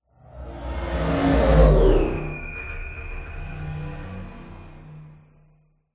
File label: science fiction craft pass by robotic mechanical futuristic